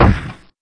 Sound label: Thud; In the Line of Duty Firefighter